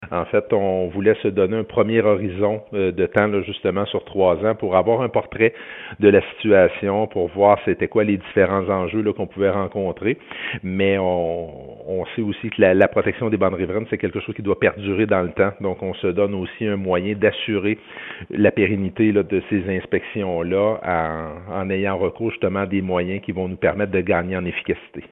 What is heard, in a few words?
DRONE